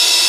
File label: rev cym; cubic